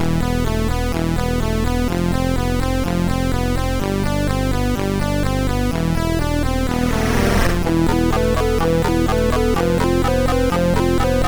Bells, MOS6581R2

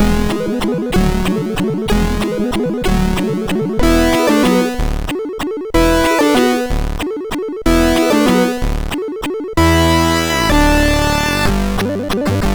Gametune, MOS6581R3

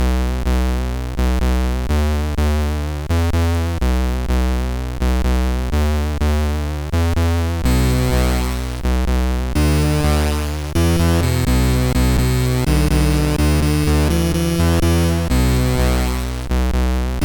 CSG8580; Momu

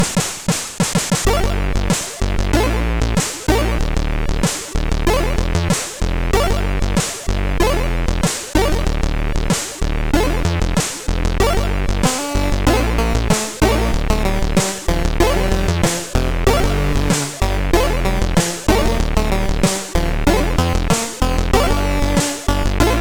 Relaxation, CSG8580R5

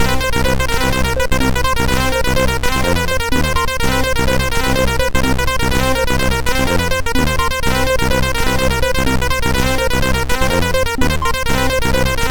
Blues, MOS6581R2

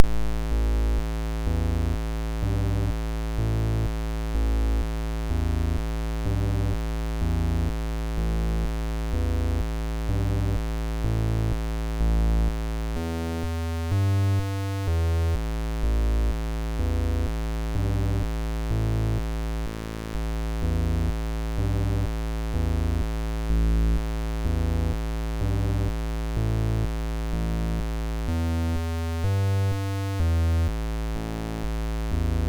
sid, MOS6581; Rust intro

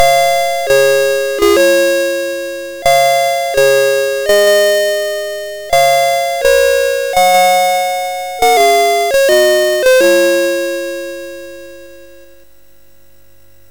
sid MOS6581R3, GAMES; Alice In Wonderland

